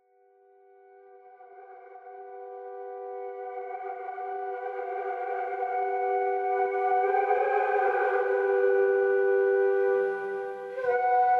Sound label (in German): trommeln